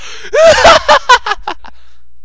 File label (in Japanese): warai